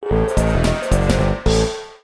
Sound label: Barrel Blast Jungle Lose